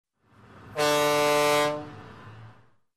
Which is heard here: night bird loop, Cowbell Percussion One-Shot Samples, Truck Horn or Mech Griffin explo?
Truck Horn